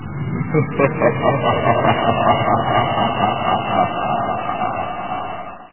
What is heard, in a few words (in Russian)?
zhutkii smeh muzhskoi v prostranstve shumnii